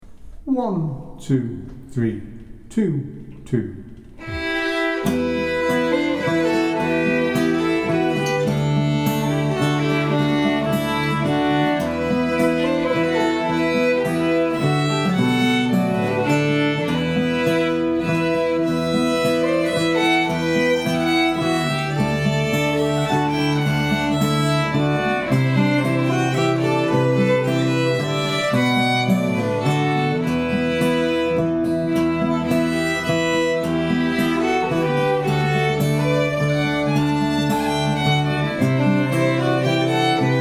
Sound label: Bonny at Morn Fiddle Tune Guitar Harmony